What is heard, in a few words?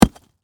Impact Ice Block, Hammer; Miscellaneous Impact; BlastWave